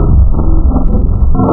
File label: KORG VS, wavetables, Classic Synths